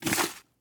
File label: Latex Glove; Medical; Blastwave